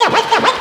SCRATCH, Underground Hip Hop; USB Soundscan vol.02; AKAI; AMB.FX

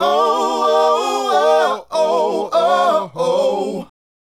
Male Group, Hip-Hop and RnB Vocals

